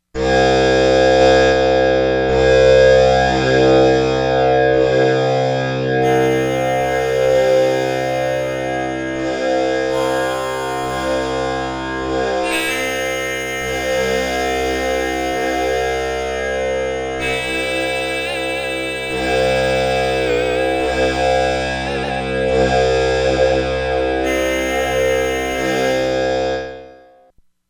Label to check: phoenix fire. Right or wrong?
Wrong